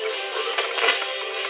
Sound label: PCM sample garbled